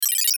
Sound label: button digital beeps; Multimedia; Flash, Animation, Digital, Bright, Chirp, Delay, Production Element, Imaging Element, Accent, Transition